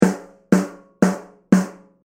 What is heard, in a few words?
phase